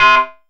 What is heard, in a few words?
SYN, 160 BPM; Ueberschall; Drum & Bass Flash Trackz